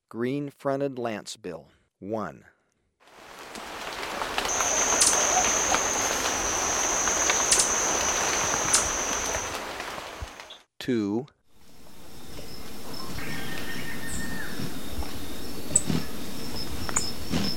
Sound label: Green-fronted Lancebill; S. America